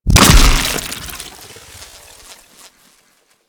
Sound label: Shock Swarm Projectile Burst; Warframe; Infested; Shock Swarm Rifle